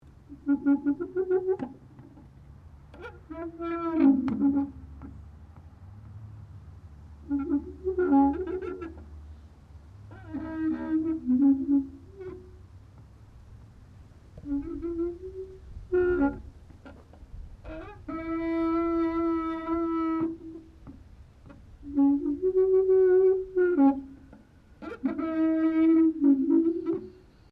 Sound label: Playground Seesaw Wood Rubber Squeaky Operating Loop; Various playgorund equipment